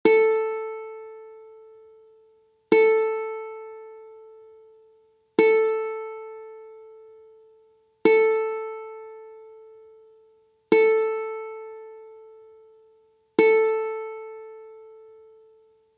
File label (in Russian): 1 struna Zvuki strun ukulele